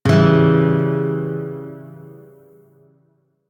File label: Shawzin Phrygian Chord E; Music; Warframe